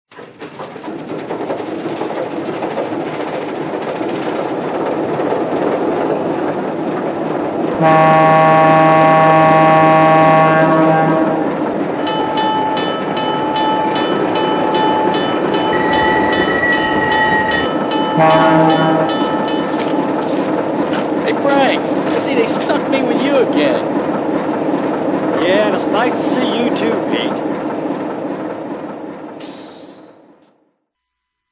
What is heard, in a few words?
VO-1000